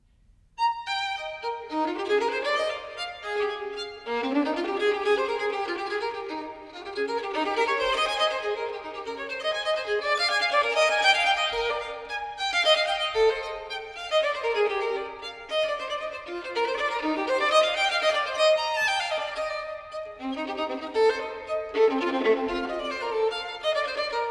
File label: Partita Gigue; Amandine Beyer; Bach; Sonatas & Partitas; BWV 1006